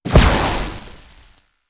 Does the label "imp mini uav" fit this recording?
Yes